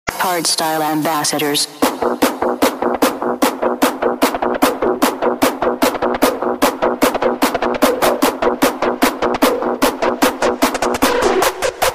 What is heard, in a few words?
hardstyle